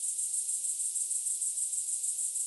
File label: ambience crickets night; adams venture origins